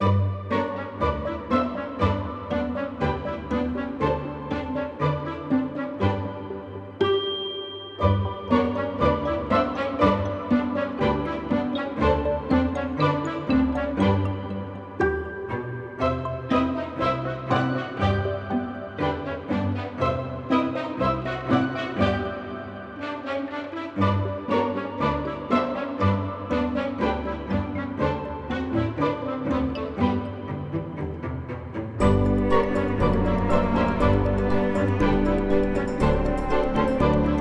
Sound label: Joyful Ambient